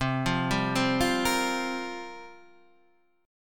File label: C 7th Suspended 4th, Guitar, Standard; C7sus4; 8,8,5,5,6,6; arpeggiated; capo=2